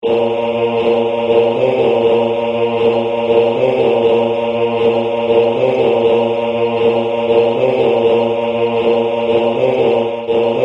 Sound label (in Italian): free at last baritono